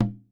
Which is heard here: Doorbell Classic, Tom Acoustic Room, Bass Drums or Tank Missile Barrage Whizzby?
Tom Acoustic Room